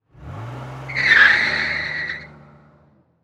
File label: Transportation Car Monte Carlo Peel Out